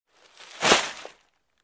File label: Garbage Bag slam